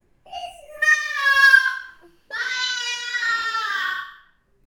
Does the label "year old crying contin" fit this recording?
Yes